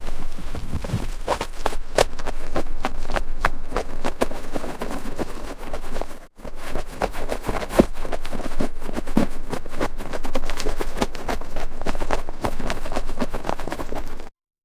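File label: hairy horse skin scratching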